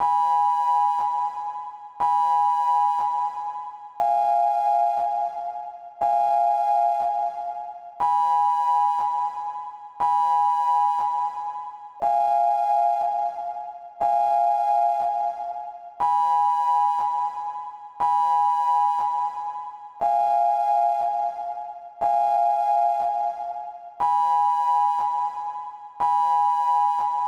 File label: sinewave